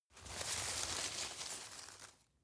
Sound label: grab rustle medium